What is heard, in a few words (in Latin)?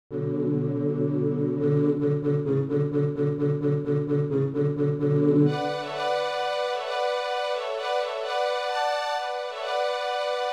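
si puer cum puellula, baritone 1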